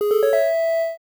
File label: jingle discover